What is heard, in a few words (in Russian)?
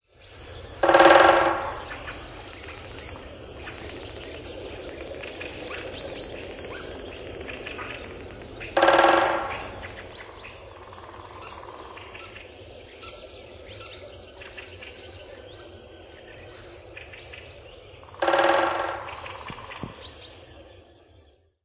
djatel